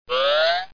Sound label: Open; Bible Fun Pack; MAZE